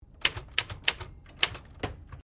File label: Typewriter 1950s Sequence; Old typewriters; Typing; Offices